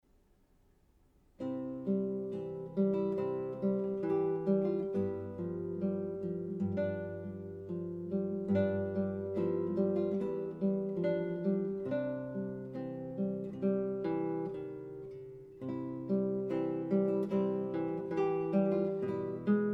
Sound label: Piu Lento, guitar; Straniera Opera Revue No. 10; Mertz